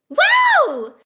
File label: whoa; sm64coopdx; char-select-extra-chars; daisy